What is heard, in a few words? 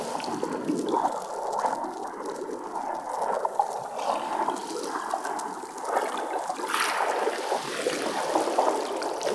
Acid Loop; Ambience; Bromius Inside